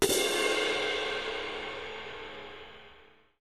biggest cymbol open

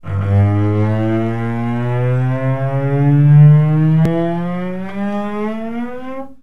slide up on G string, cello

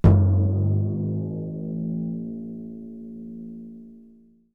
timpany G B

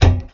thump, tuba

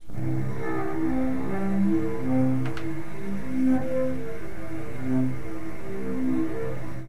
harmonics, cello

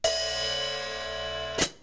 hihat open fully, percussion

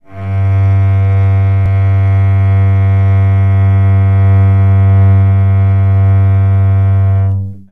g string open, cello

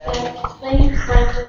wierd lip pops, tuba

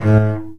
short strum, cello

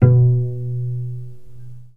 pluck, cello

